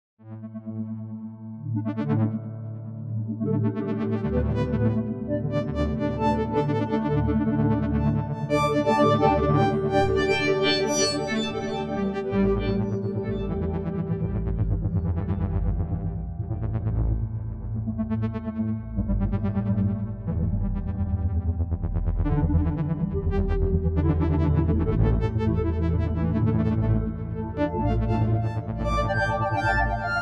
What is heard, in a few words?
pads dry; dense fog machine